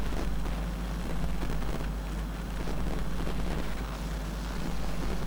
old tv electricity

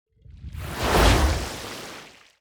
water sub create

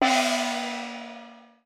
beat gong

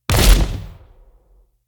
fire sword

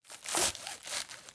cut vegetable one

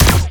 energy gun

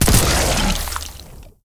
atk ink gun

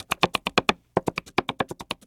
cut fast

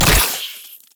skill poison

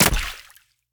water bubble

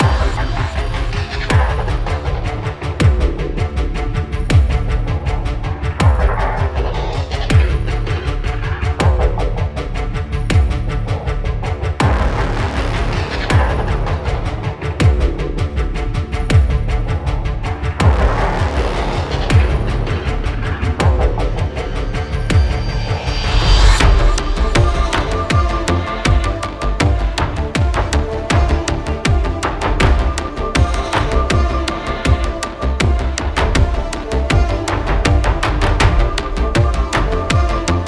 frontline loop

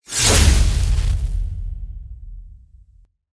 sword

